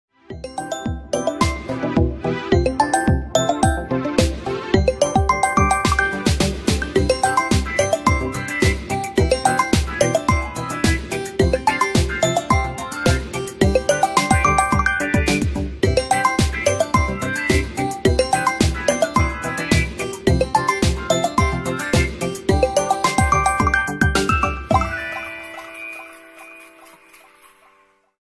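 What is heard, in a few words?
vivo default mặc định ringtone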